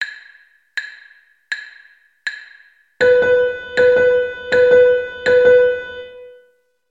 16th Dotted 8th

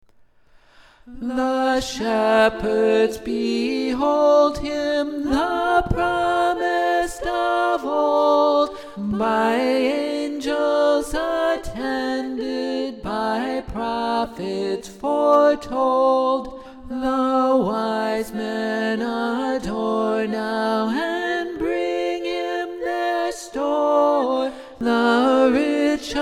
Alto; O Mercy Divine

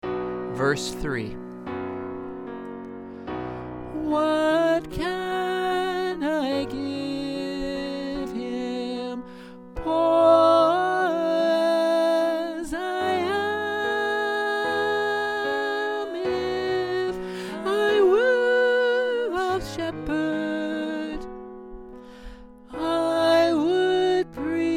In the Bleak Midwinter Soprano

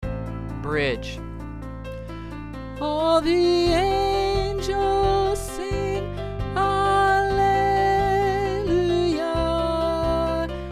He Has Come For Us Alto

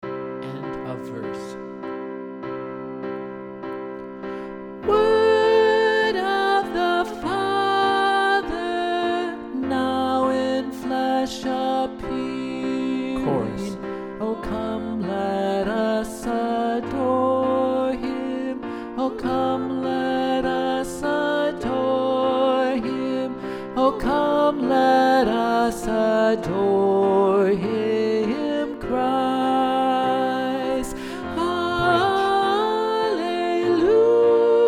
O Come All Ye Faithful Alto

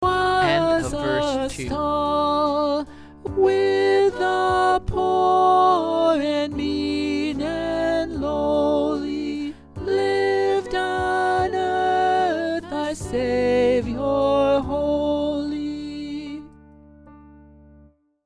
Alto; Once In Davids Royal City

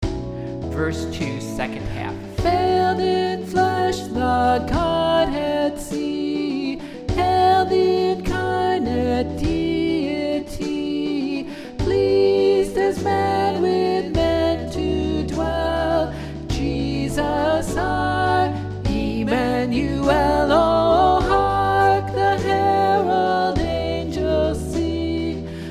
Alto; Hark the Herald

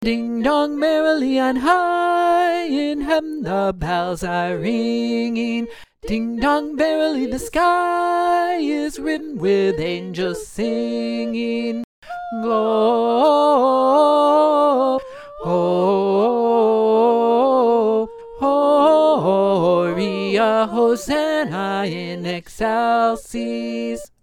Alto; Ding Dong Merrily On High

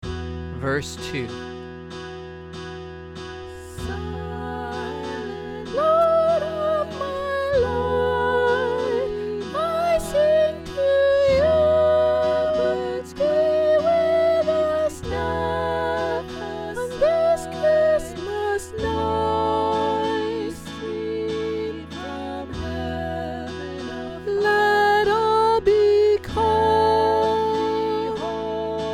Soprano; Silent Night

